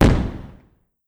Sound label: confetti Explo; marble race